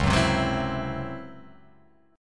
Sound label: C sharp Minor Major 7th Double Flat 5th, chord; C#mM7bb5; strummed; JGuitar